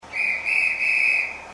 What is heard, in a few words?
Guards whistle, steamsounds